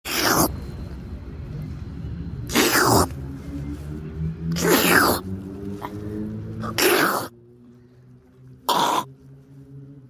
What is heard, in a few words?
eating